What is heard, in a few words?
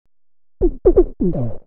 HOUSE FX 125